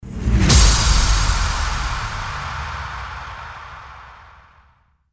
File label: IMPACT